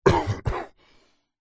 giant man cough; fantasy

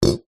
household flask thermo metal set down wood; zapsplat

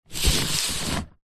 foley cardboard box parcel wrapped paper slide

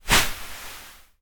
beanbag jump on; household; zapsplat

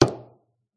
pub bottle full down on beer mat; field reports

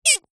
leisure toy squeaky squeak broken

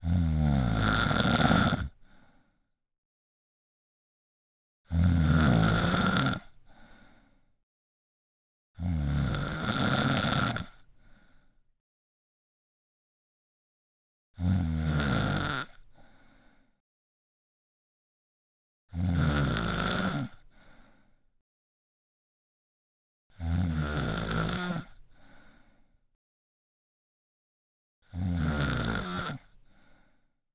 human female snoring

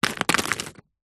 small rocks rubble drop pieces; foley; zapsplat

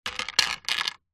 household broom plastic fall over

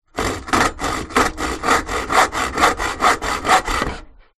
Hacksaw Short

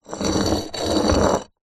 molded plaster object small scrape on table

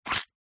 wallet unzip coin movement

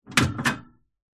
animals mouse cage metal cage hatch close; zapsplat

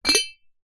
household teapot ceramic lid remove; zapsplat

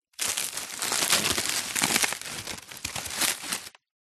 foley dried leaves movement crunch

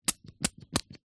perfume spray empty; household; zapsplat

